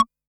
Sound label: Analog SY1 Perc